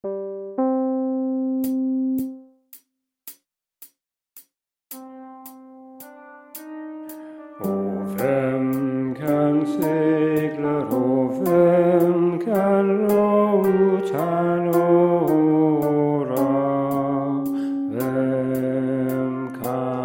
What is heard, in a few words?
Middle Sung in mens register; Hvem kan segla